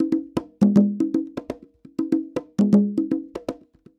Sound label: Congas 120 bpm, African Drums; Quazibeat; African Secret